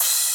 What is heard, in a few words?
cym